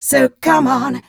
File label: f mel c, fem Vocals